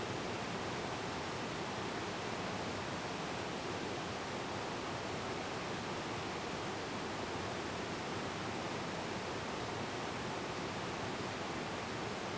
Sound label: taughannock lower falls